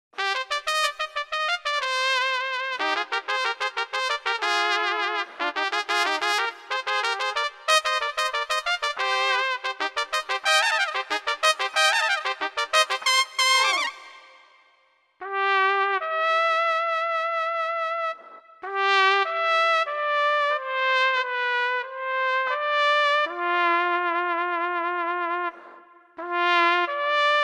brass

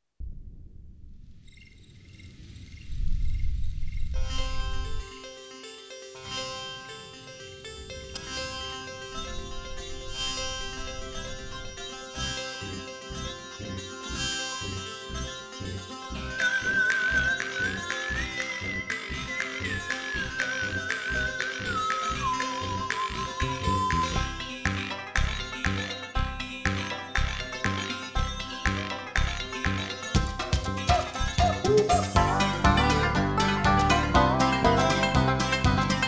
country

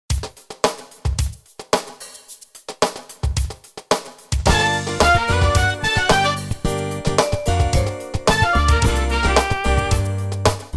fusion

